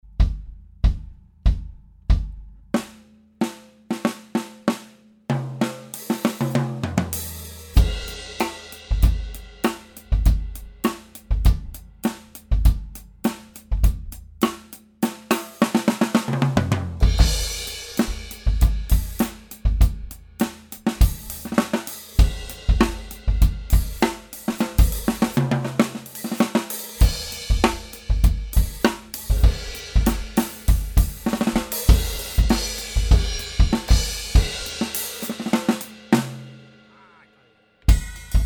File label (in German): Overhead und Bassdrum Rohsignal; DF-Nord Drums Handyrecorder